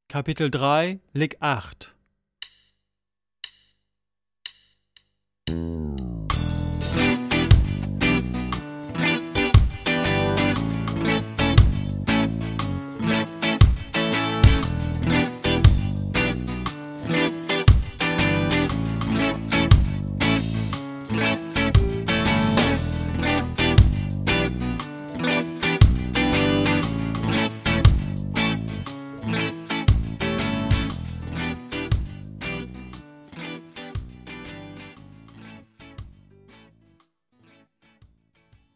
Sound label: Latin Style Guitar